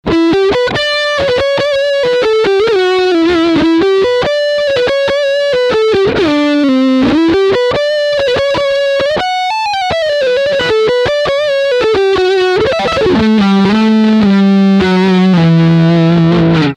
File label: Leadline Front humbucker